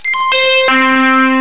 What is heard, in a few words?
fuzz guitar